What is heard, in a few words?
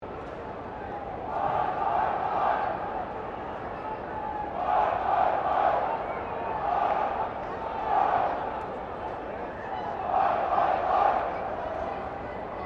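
crowd 20K Melbourne Cricket Ground chant low, Cricket at Melbourne Cricket Ground; Crowds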